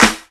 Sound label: Clap Snr; Multisamples; Mo' Phatt; Digital Sound Factory Mo' Phatt